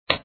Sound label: Pop; btd4